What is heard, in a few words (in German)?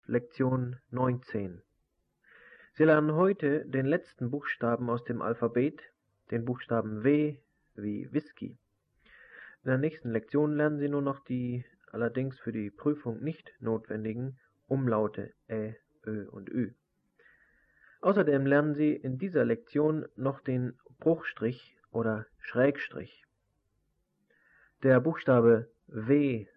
Morsen; Onlinelehrgang